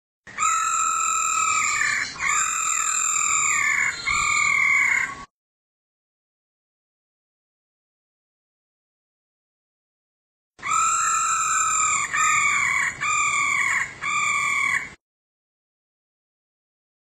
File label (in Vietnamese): Tieng Khuou mai ro kich trong